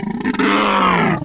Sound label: voltigore alert